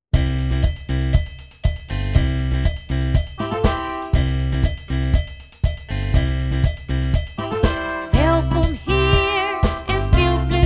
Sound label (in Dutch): openingstune; Bas Viola en de Muziekhelden